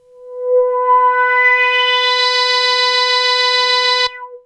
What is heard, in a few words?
Arp C B4, ARP Odyssey; synth; musicradar-synth-samples